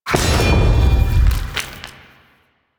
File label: Spear Impact; Warframe